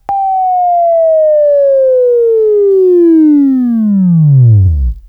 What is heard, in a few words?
WAV Left, Sweeping; Sweeping Training program